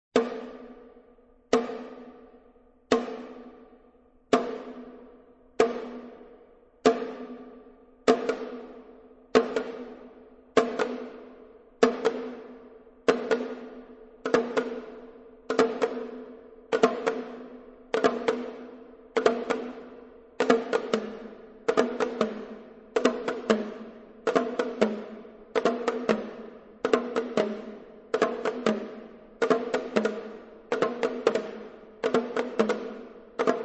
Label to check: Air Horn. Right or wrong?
Wrong